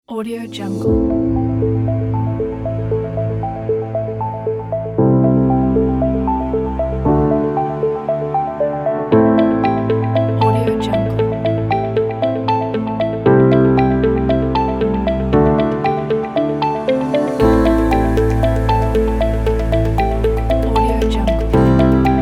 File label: presentation